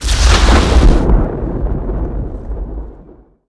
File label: electric bolt